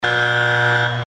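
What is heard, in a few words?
Buzzer, Buzzer That Sounds When Judges Have Had Enough On This Reality Tv Show; Britains Got Talent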